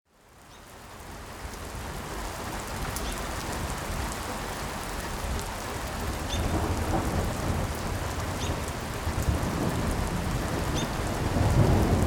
thunder and birds

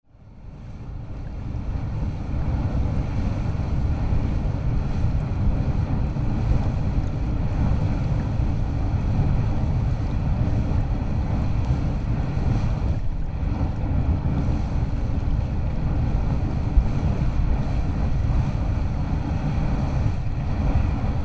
Puff n Stuff Geyser

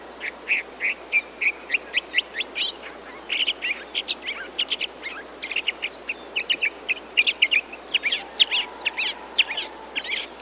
Northern Mockingbird

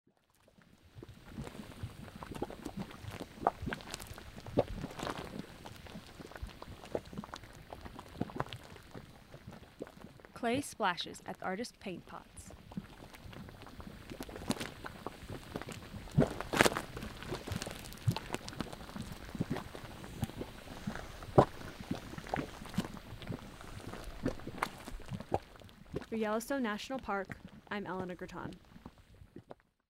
paintpots; shotgun

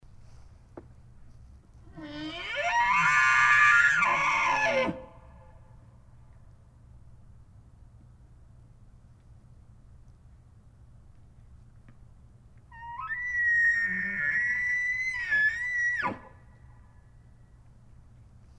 Elk Bugle

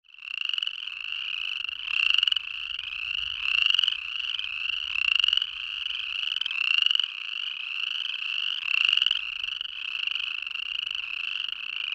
Chorus Frogs

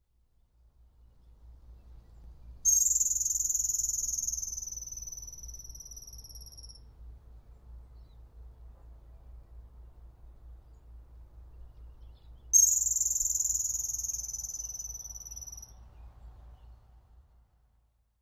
Uinta ground squirrel

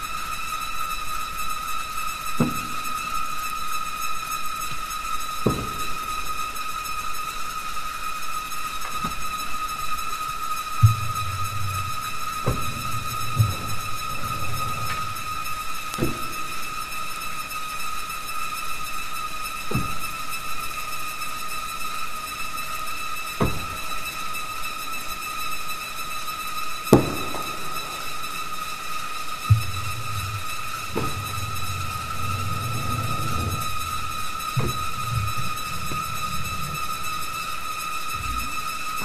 Humpback whale tailslaps propeller whine

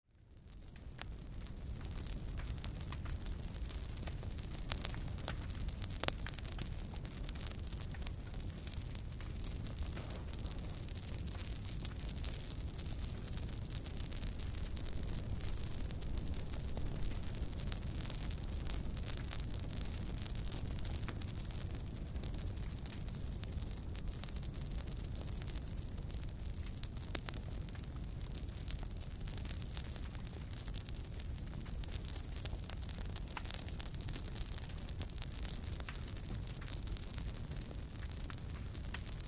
Maple Fire

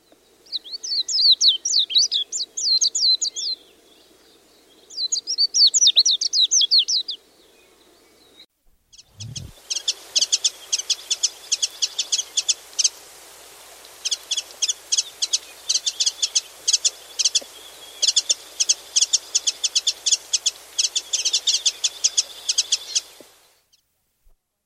Japanese White-eye

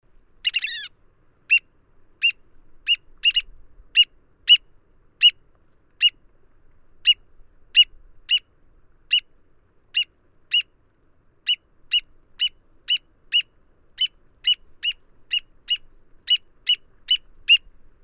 oystercatcher

